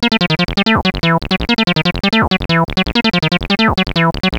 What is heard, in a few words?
x0x; x0xb0x